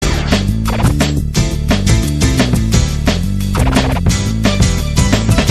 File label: scratching